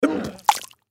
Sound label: Human Gag Vomit, Gag Vomit Liquid Splatter Element Male 20 Yrs; Gagging; Voice; Blastwave; Humans